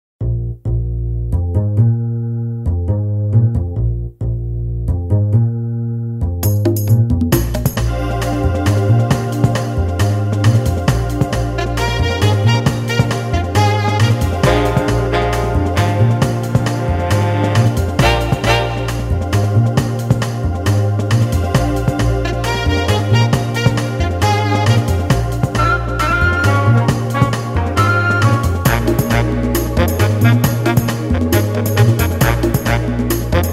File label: Blues; filmmusik